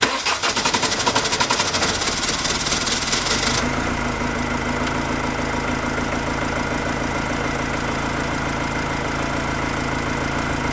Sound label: difficult starting car engine